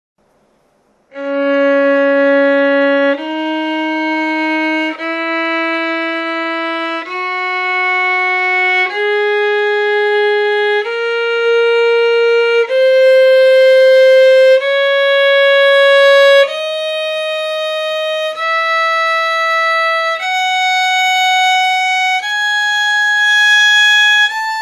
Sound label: scale arpegios cis moll